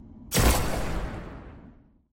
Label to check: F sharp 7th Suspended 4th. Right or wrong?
Wrong